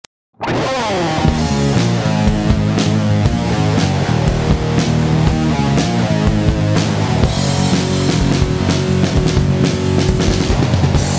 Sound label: Rock Cover